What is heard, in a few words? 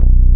SUB BASS; E-MU Formula 4000 Series Vol. 2